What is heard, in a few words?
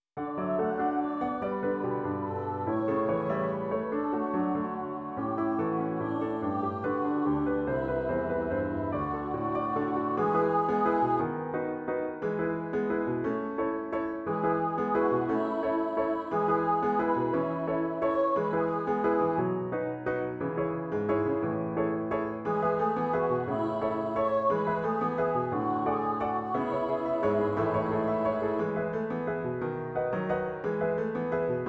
Piano